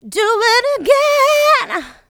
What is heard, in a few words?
Female Vocals; Houseworx